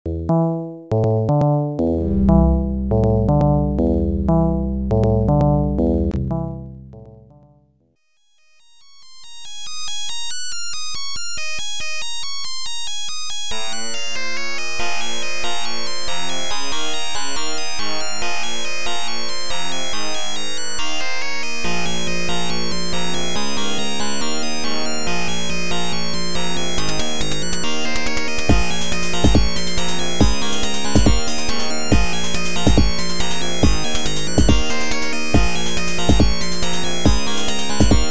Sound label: title music; 3D World Boxing PC